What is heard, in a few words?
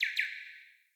Cardinal Male Bird Double Chirp